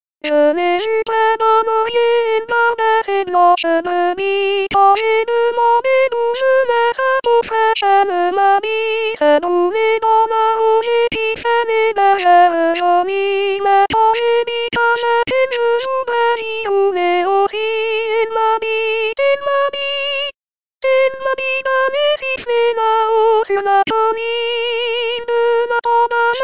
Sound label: zai zai soprano